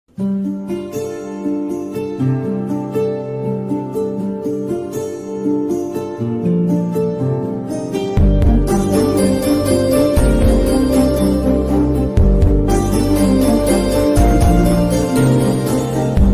best kannada ringtone english ringtone no copyright music bgm ringtone